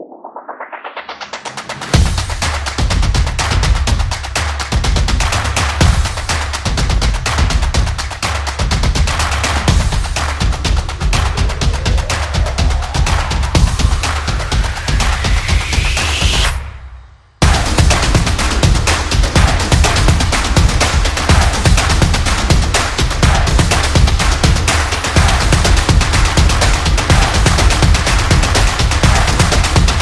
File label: Sports Intro; high energy